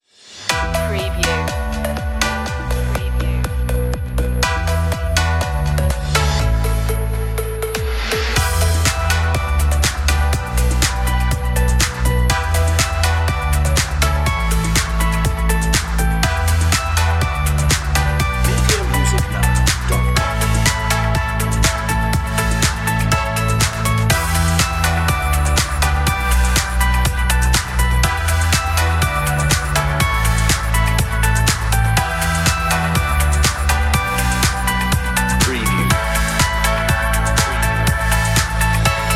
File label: sweet dreamy inspiring house